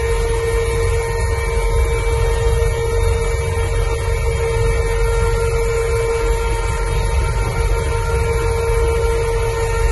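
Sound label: inside hover loop stereo, Lynx internal audio loops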